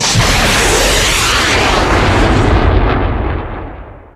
missile launch effect

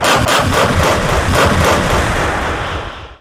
pion 203mm fire offmap distanced

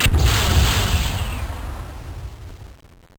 missile single fire

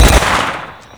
harvester fire; gdi

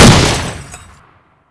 minigun fire

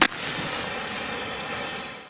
dynamite ignition

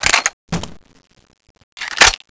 reload

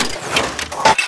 reload missile

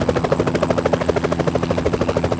comanche idle

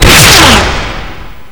rocket launcher fire

